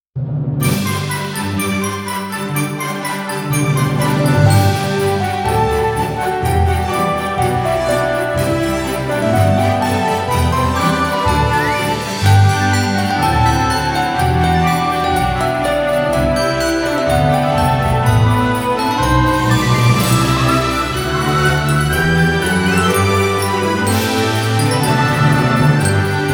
every christmas trope ever, festive bells; blueprint festive bells by fracture sounds

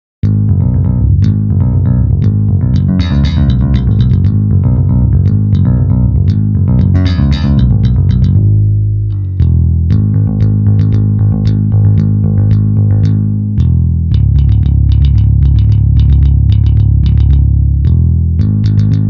finger neck, rock bass; virtual EII BTL MIDI rock bass; pfundstein audio plugins

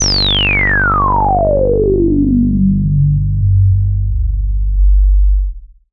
Steep Res Sweep; Saurus

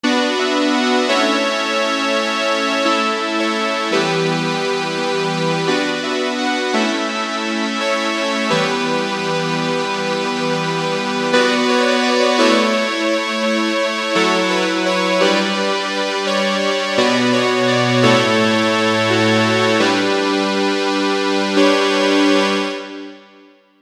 fat saw lead

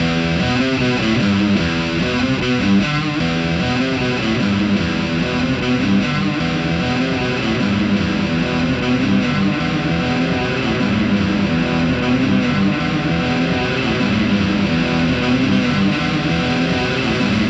hard rock guitars; septimbral

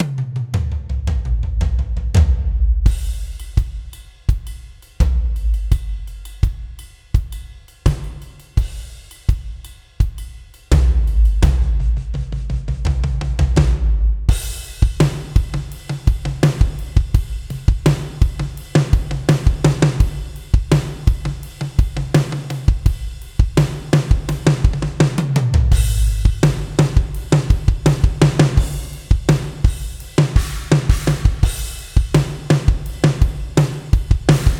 gospel drum only

